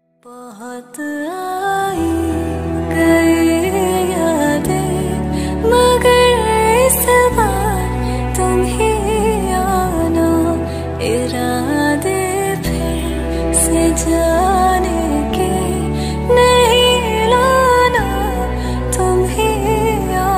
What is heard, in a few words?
female version ringtone